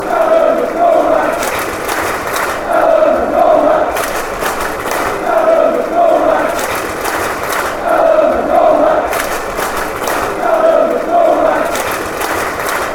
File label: fanchants; alan mccormack